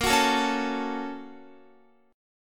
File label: Bb Minor Major 7th Sharp 5th, Guitar; strummed; 4E,4A,5D,5G,5C,6F